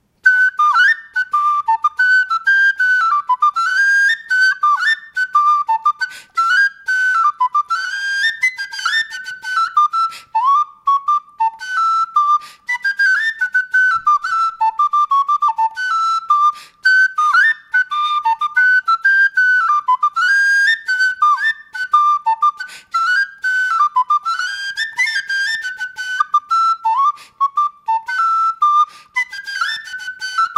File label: night piece recorder